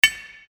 hammering

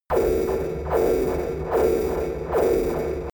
transmission signal pulsate

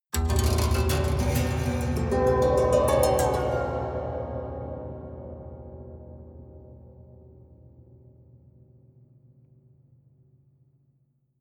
haunted strings up stroke slow hard

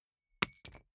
key ring small drop carpet

